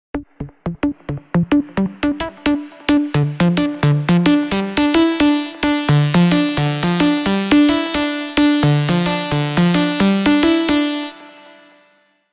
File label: pluck; luminous